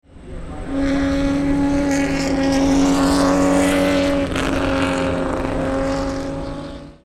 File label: Ferrari 250 TdF